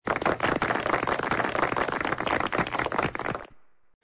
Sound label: taps